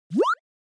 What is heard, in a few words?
Anime Motion, Mid